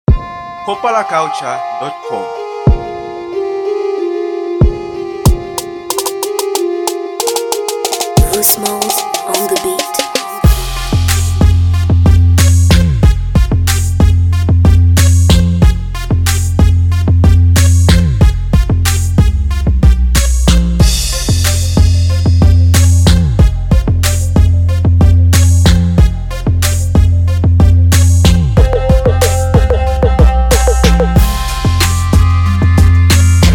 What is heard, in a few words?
Rap Beat